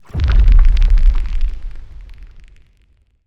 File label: net shield, anomalies; Arrival